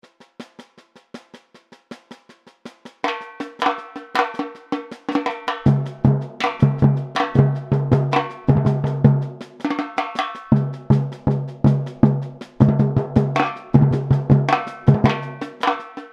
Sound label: break, samba; Pirate Samba